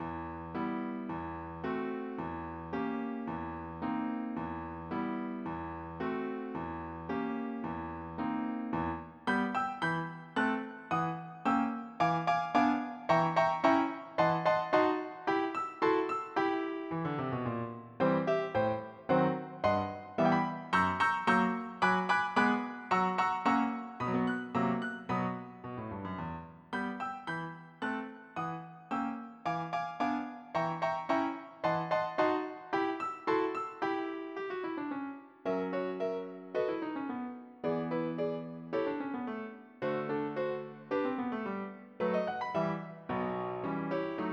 piano; 501 Great Games - Volume One; Guildhall Leisure Services

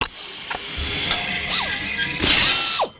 ship ring close; Earth and Beyond; EA Games

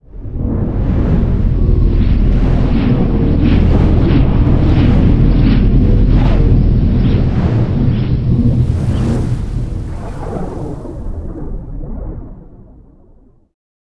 Terran Large Open

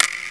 steel popup; Steel 99

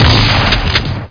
shotgun; Total Chaos Nukem 3d Pack

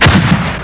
REGISTER

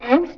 lid sound

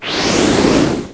wind blowing

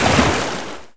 splash; fight

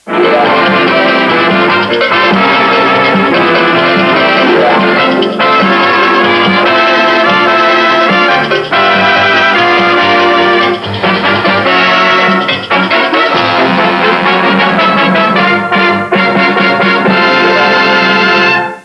theme

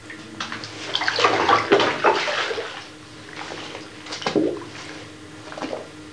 plaetsh

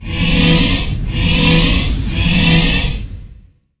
weapon slow; Earth and Beyond; EA Games

